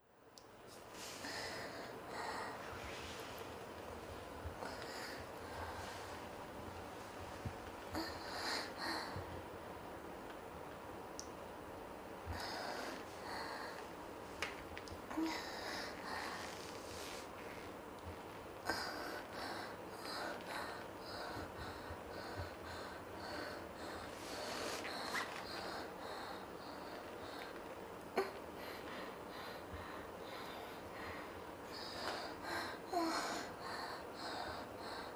グラビアアイドルのオナニー中の喘ぎ声

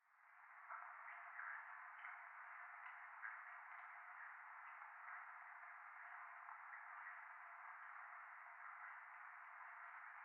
教会 フェラチオ 雨

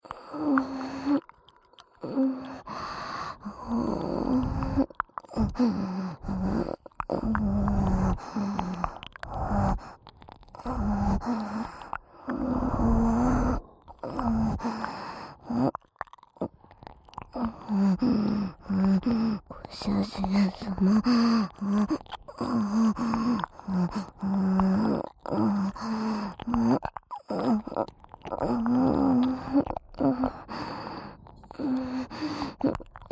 L耳舐め＋Rオホ喘ぎ; 耳元オホ声、耳舐めループ; オナサポ

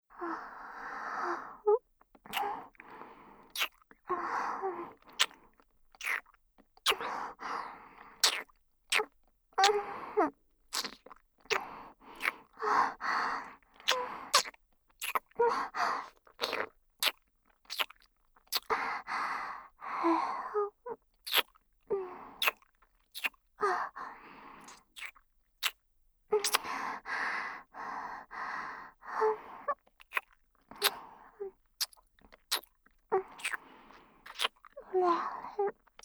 全肯定キス媚び手コキループトラック, ASMR

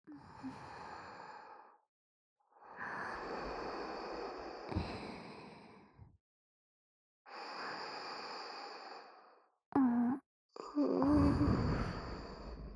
左右同時寝息ループ

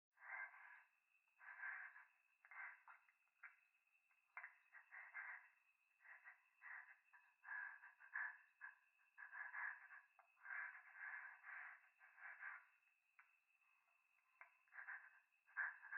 風呂 右耳 快感 吐息 山奥 夜

